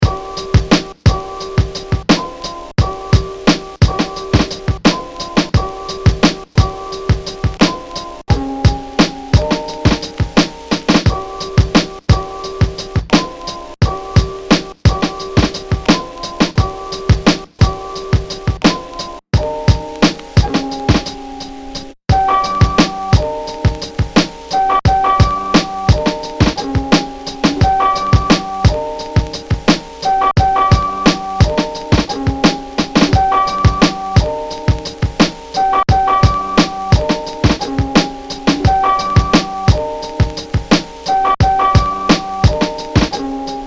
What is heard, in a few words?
random jazz beat